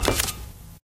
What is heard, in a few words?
black tile, footsteps